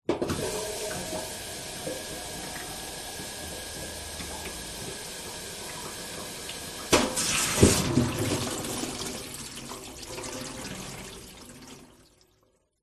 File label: household metal kitchen sink tap run water drain through pipes under sink; zapsplat